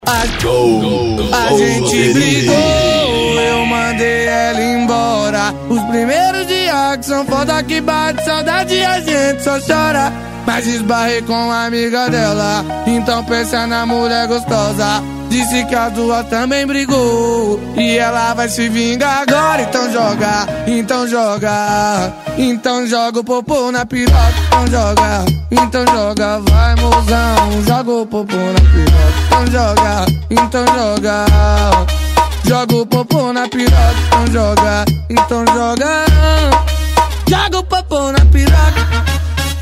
especial funk

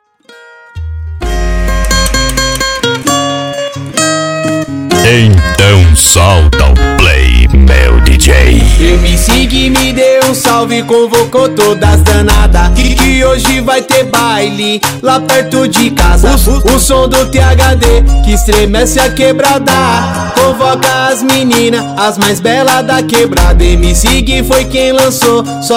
mega funk

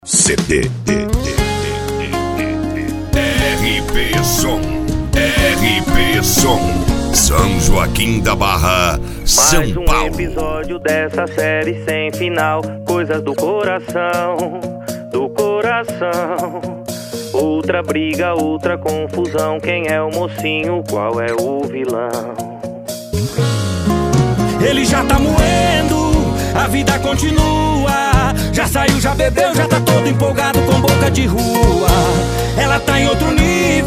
sertanejo universitario

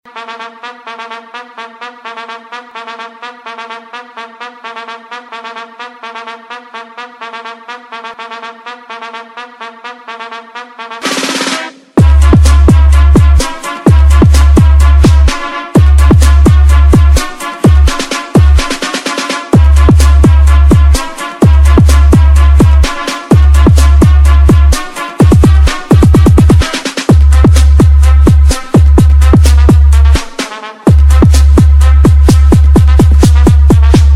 racha de som automotivo e som musical

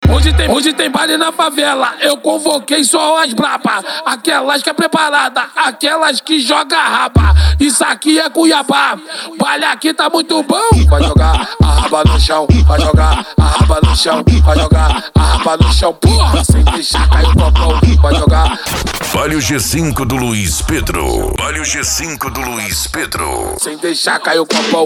funk mega bass e forro; urupa ro

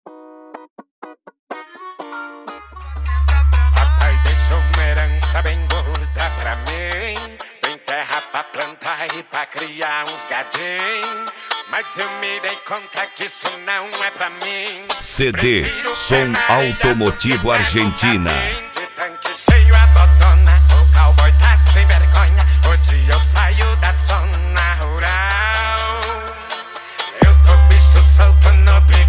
som automotivo

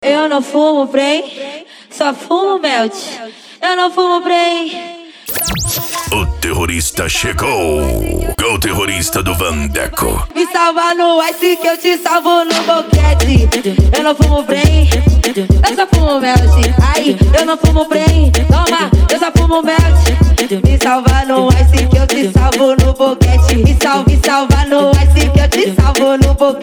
dance e funk mega bass

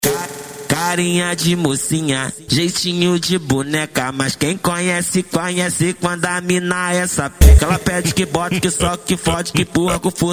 funk agressivo funk

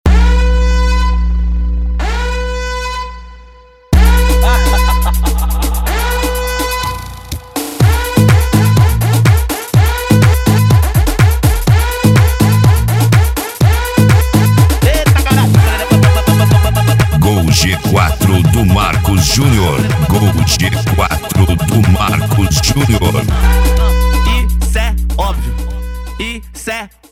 helicoptero eletrofunk

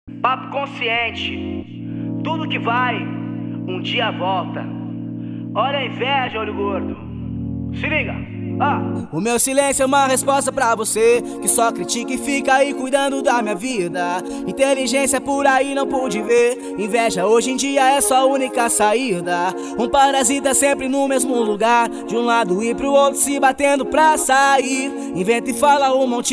explode funk

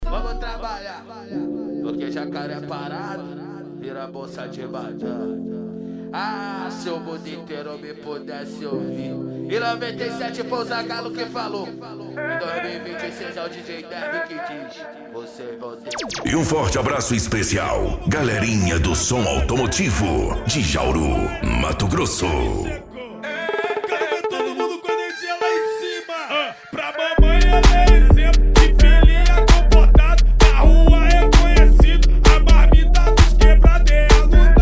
som automotivo

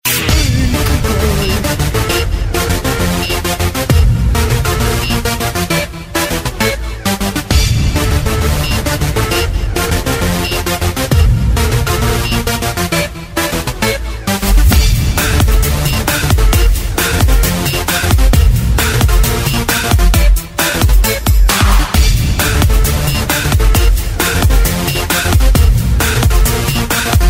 especial funk e electro house

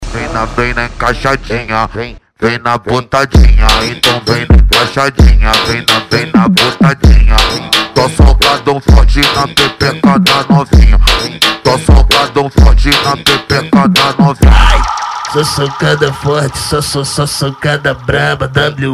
sound car funk; street

